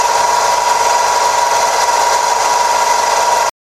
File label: bad alternator